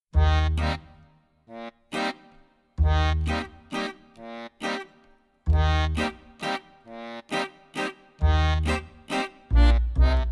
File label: accompaniment